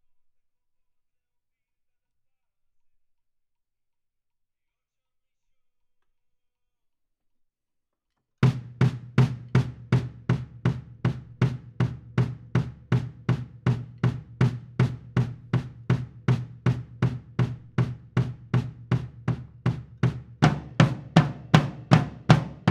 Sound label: Kit, 160 BPM